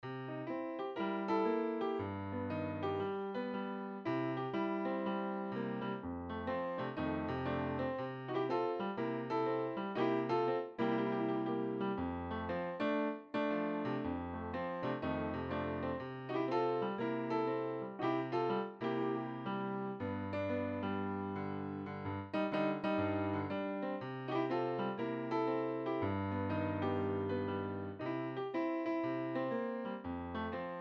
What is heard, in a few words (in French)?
saint louis blues sans melodie C